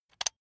electric button drill direction switch forward soft